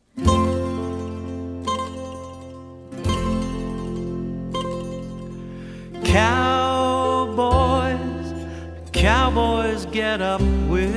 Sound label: Cowboy Waltz